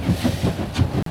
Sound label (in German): Dampflok DB 64 491 Rollen